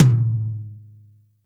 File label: Tom; Roland R-70